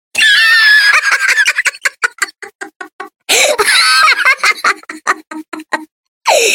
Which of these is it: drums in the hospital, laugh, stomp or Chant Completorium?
laugh